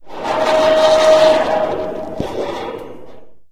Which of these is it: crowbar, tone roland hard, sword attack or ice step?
crowbar